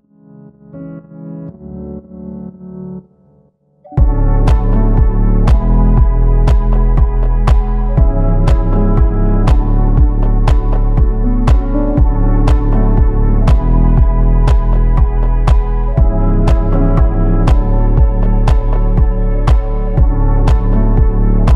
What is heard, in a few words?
Instrumental